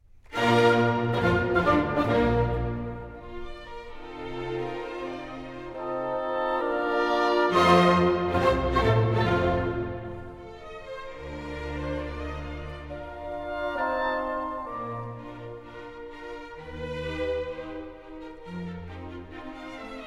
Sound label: Piano Concerto No. 2 in B-Flat Major; Op. 19; Beethoven